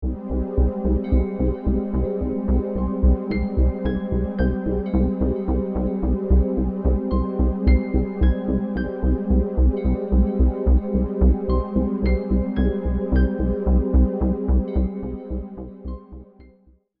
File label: Lo-Fi Casio Horror